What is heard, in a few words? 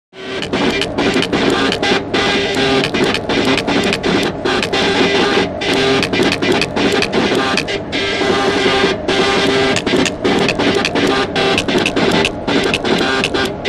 Graph Plotter IBM; fax scanner copier